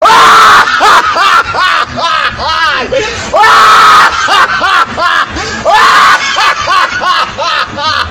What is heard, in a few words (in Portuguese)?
risada escandalosa